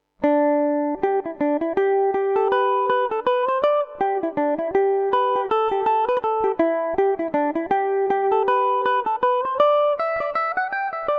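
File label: G major revelation, Irish mandolin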